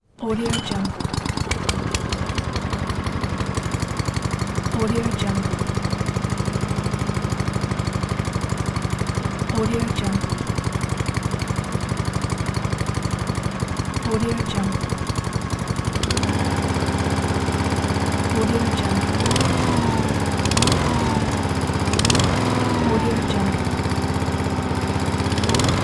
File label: lawn mower engine; soundcreator